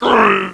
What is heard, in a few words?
pain; HALFE LIFE 3